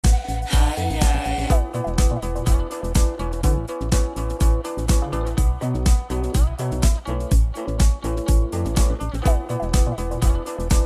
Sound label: deep house